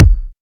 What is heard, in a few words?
good kick drum single shot d sharp key